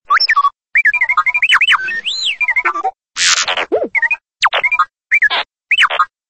R2D2

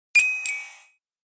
100kmh tone

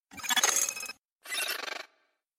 Notify In Out; ISAC

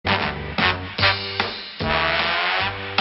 Trombone

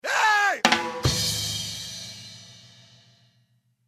Rim Shot

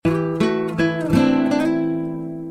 Short Guitar

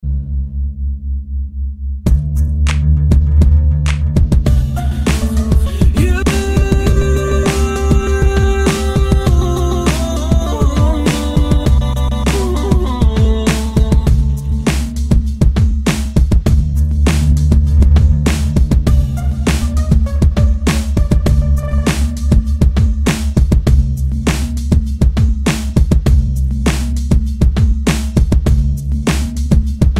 Beats

